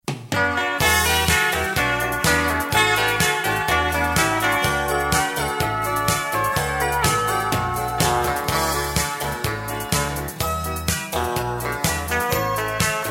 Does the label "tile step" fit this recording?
No